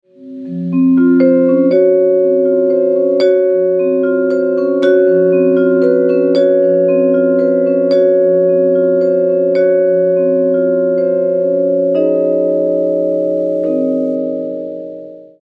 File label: vibraphone